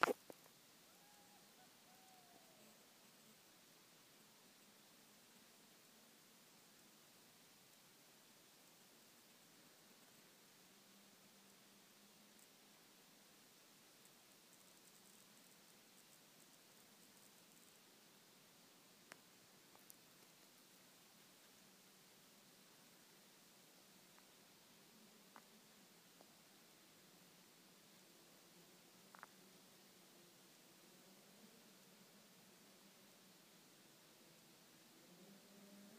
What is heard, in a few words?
Silence Penetrated by Snowmobiles